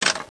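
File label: Bumper, pinball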